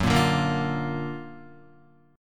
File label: Gb Augmented Major 7th Gb down Guitar 4F,4A,5C,5G,5C,6E; Gb+M7 strummed; JGuitar